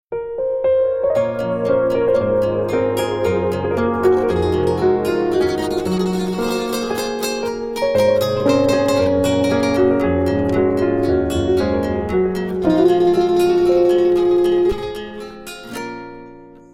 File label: Pop